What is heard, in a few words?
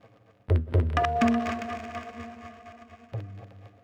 PERCUSSN ETHNO 125, Drum